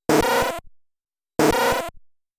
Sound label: Flame-breathing; The Tower of Druaga; Quox